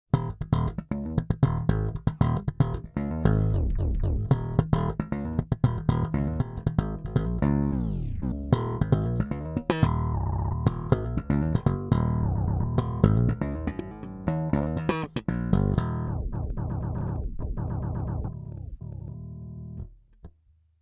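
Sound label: Break Looper; SR KAOSS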